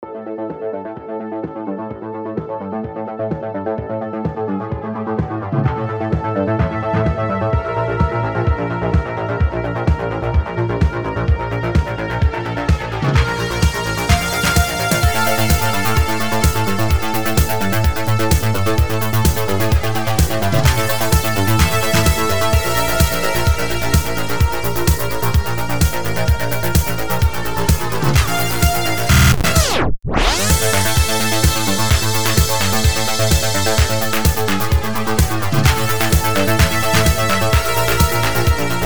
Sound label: dark retrowave; arcade last boss